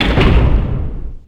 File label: door hall close; left4dead; raccoon hall